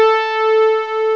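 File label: ensemble, ANALOG; CMI Sound Library Rev 1.3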